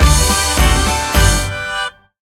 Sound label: big Win End; jingle spin